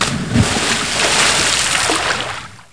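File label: jump water, impact; SINDEMO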